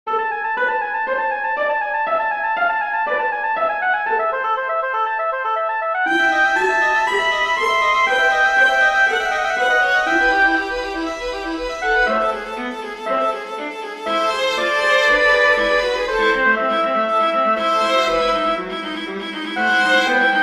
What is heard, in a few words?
canzonetta minor for oboe strings; Dieterich Buxtehude